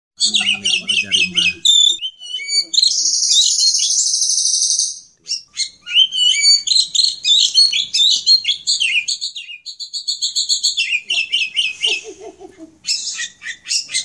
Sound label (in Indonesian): kicauan kacer; raja rimba